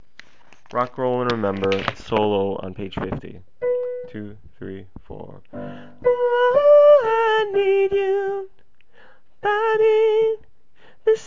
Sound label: solo; RRR